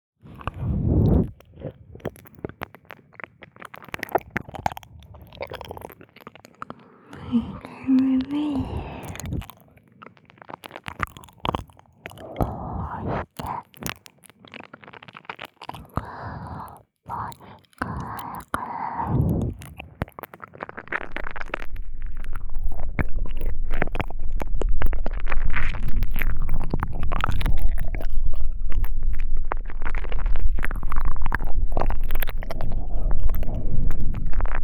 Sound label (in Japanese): 右耳耳咥え舐め, ASMR